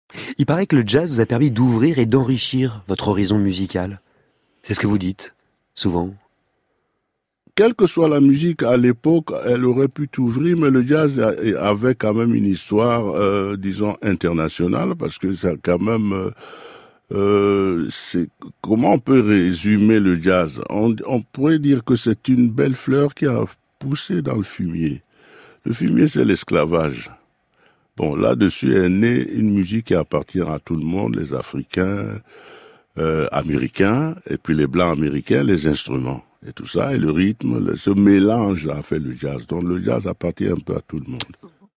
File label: jazz